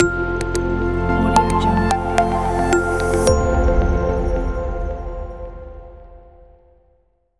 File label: corporate logo